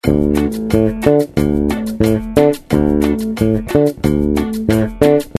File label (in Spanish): Patron bajo cumbia; how to play cumbia bass